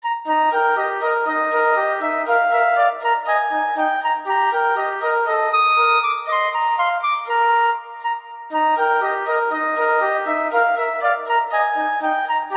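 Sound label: Duet, horn; Mozart; Duets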